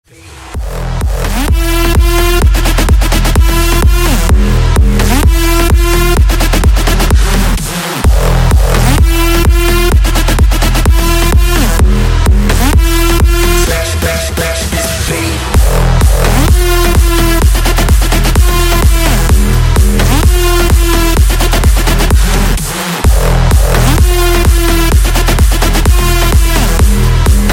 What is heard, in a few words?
beat